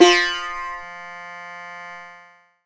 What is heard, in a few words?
eth, Ethnic Synths